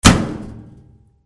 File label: HOUSE